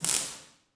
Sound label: spark; electrical sparks